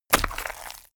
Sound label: mud water splash impact; berufe; soldat